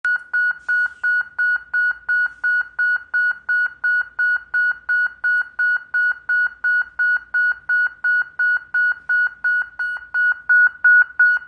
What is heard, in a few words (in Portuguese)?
sirene alta potencia